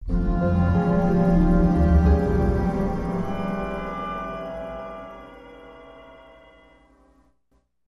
Sting

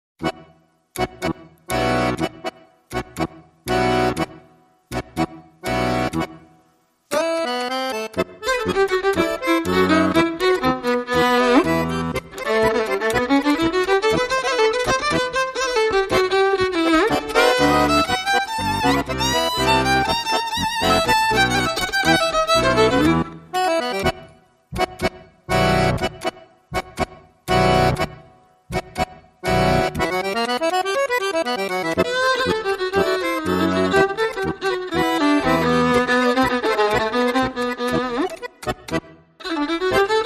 Violin and Accordion

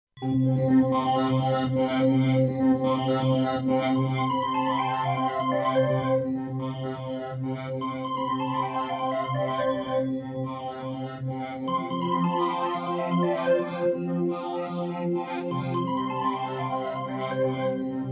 Delicate Scientific

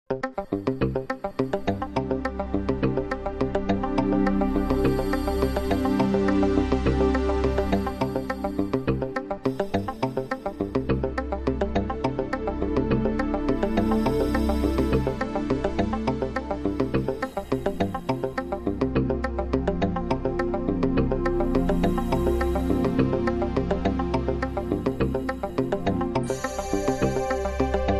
Percolating Industrious

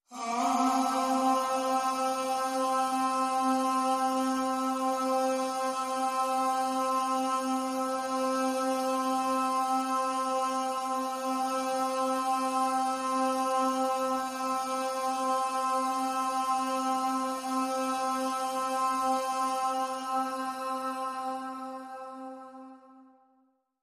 Pop Vocal C No Scoop

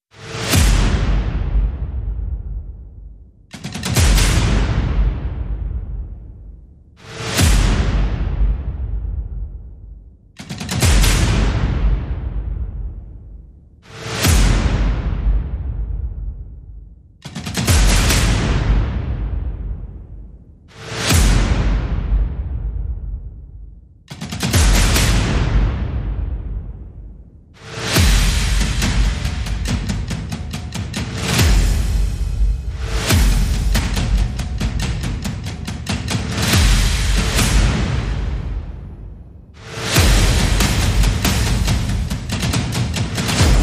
Percussion Only

